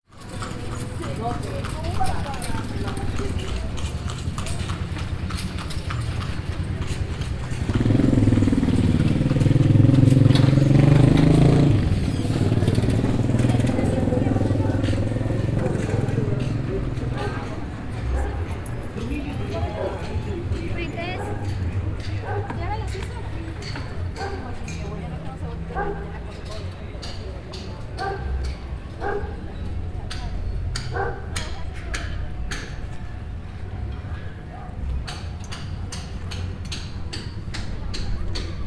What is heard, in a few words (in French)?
bogota tintal objets sonores